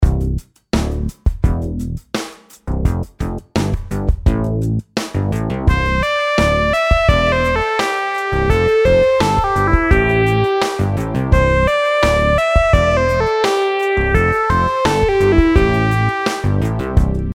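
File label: melodies simple 8s triplets C major; melody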